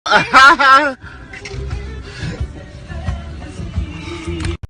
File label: laugh a ha ha ha ha; spice adams